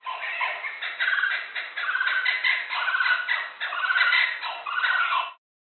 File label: blue winged kookaburra